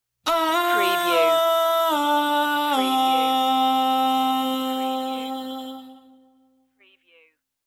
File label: rockin stinger